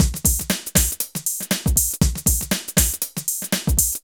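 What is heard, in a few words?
drum loops, right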